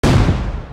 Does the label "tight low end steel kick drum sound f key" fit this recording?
No